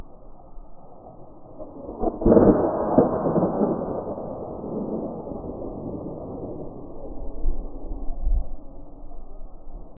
wide drone shot of ancien

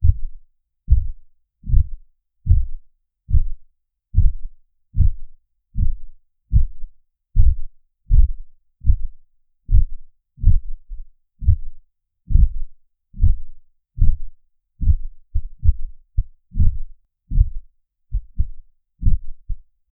fast human heart beat; anxiety

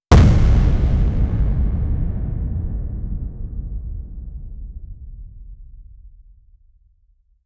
hammer slam with shockwav; godlike

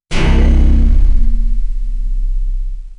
reggae bassline strum sta

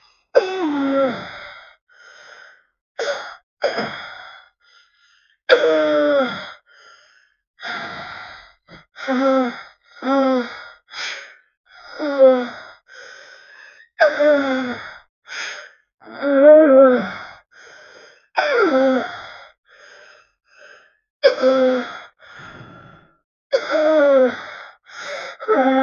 years old man holding, mouth closed; 18

